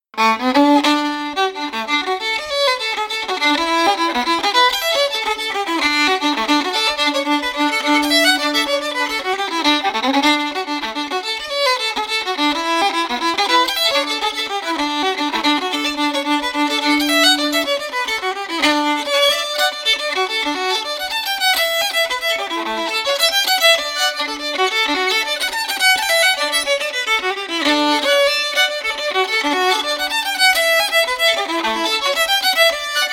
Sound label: fiddle; SeamusGrantFestival; tune tutor